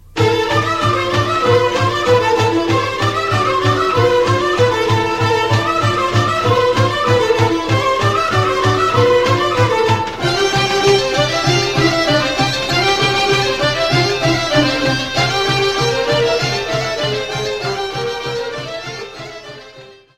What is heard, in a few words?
Horo; Bulgarie